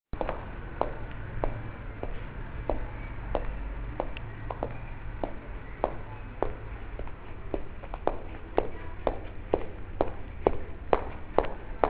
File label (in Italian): passi; riminisoundscape